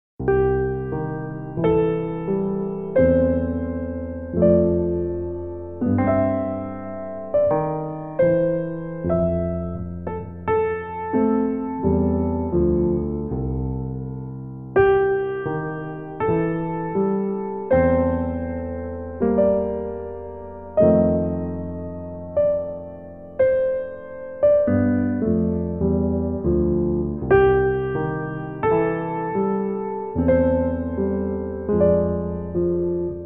Penguin Blues duet

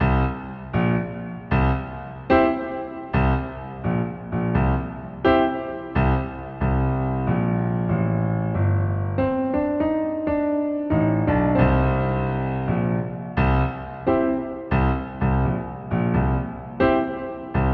Blues

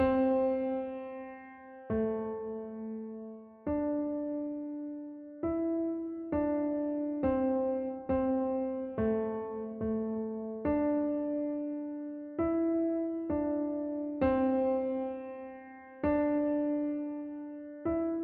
Jazz Walk

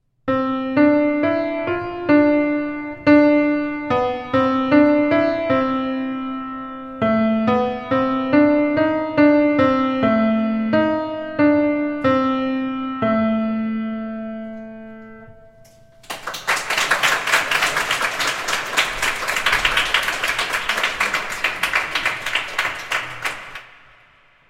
Hound Dog Blues; Recital

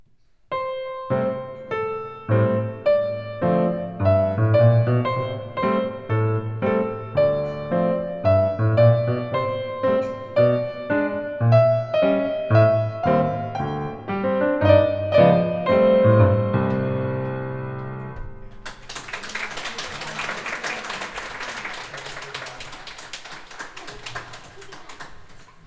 Jazz Walk, recital

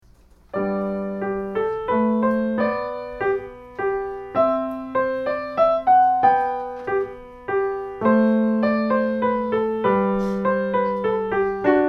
Minuet G Major, recital; Bach

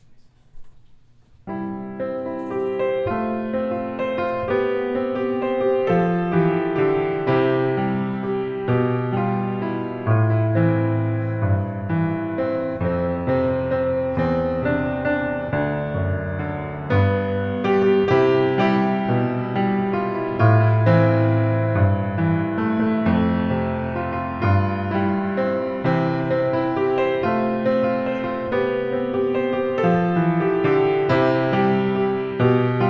piano; Recital

